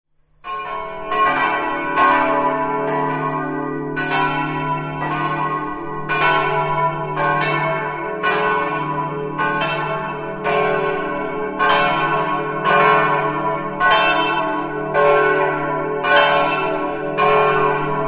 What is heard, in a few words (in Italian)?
capriva, campane